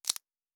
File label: Bone; Survival Game Sounds - Lite Edition; Combat and Hunting